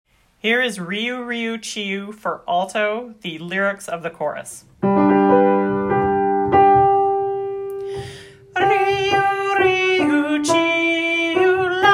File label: alto lyrics; riu riu chiu